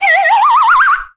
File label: slide whistle